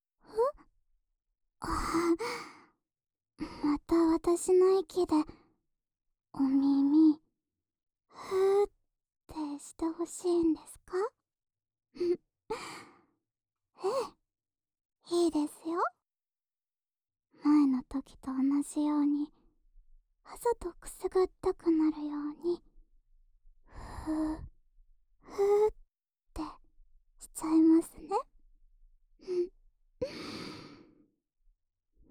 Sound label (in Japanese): 安眠用お耳ふーふー（右耳）, ASMR